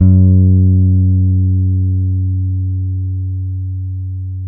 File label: F#3, Bass, WARM FRETLES; Spectrasonics; Bass Legends CD 1